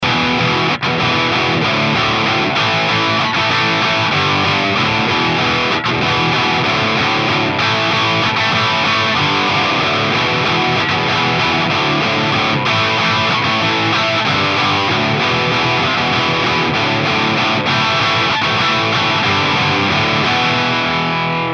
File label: FAME T120H OD, guitars